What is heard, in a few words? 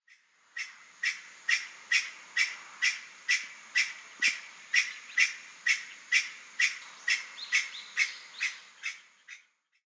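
Chinese Bamboo Partridge, Sano; koe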